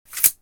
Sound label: metal